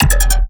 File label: bass hit, Bass Stabs; Virtual Riot; dolphins